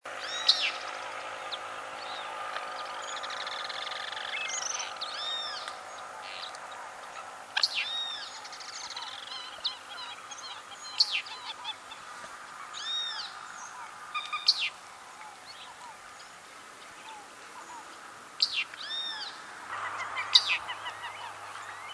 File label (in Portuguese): canto do lavadeira de cara branca